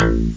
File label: funk bass; Atari Mega Archive